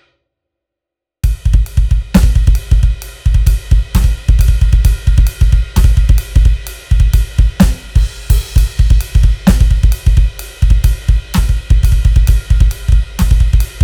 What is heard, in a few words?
doublebass sample, drummer; drummer sucht metalcore alternative rock post hardcore band